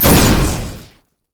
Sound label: w oh initial; Vel'Koz